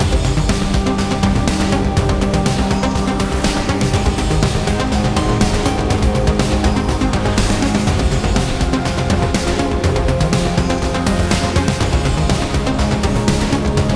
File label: BGM Kowloon Street Boss